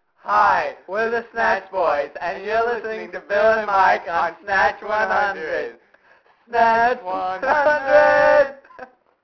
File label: snatch